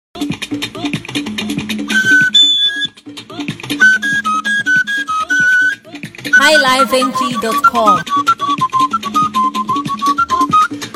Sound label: Igbo Ogene Oja Flute Instrumental